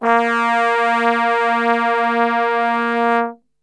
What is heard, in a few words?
A#1 Brass Super Section; Best Service; TRMBSTRGHT; 90 s Sample CDs